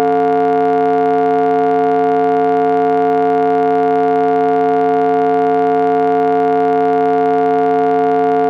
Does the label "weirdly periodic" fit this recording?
Yes